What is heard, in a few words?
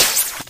thwip; spider-man